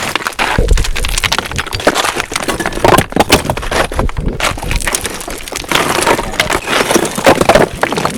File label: Rocks